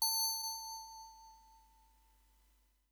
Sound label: transform; mmrnmhrm